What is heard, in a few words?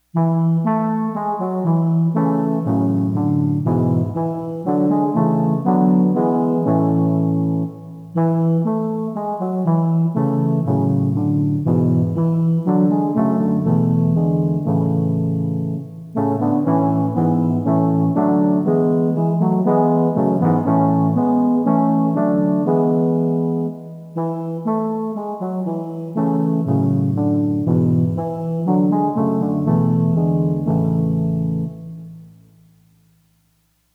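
Hymn trombone; Easter; Tbn 4tets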